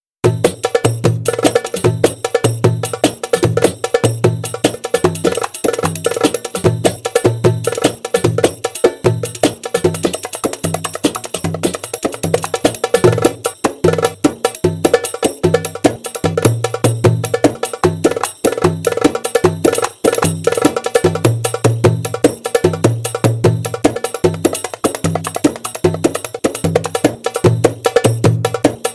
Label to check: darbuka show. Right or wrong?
Right